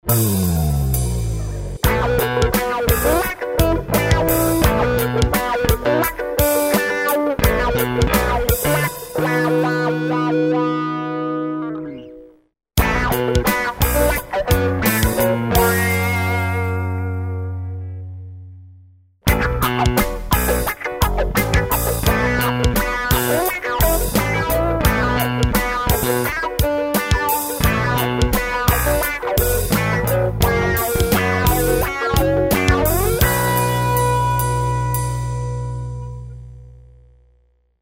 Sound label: funky